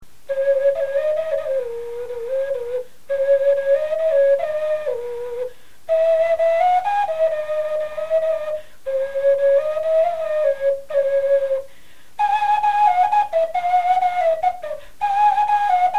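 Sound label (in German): melodie, Knochenflöten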